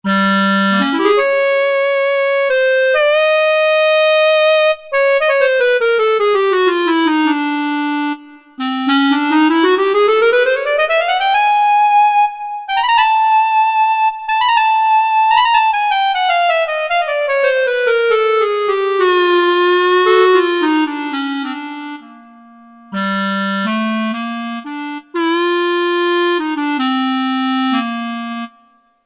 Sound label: Orch Clarinet; Kurzweil K2000 Orchestral ROM